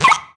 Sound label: bat death